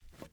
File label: wood jump